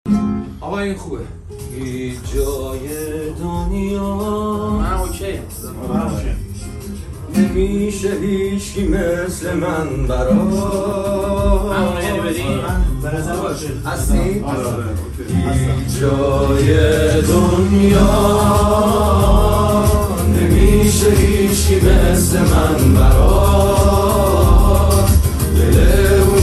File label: Guitar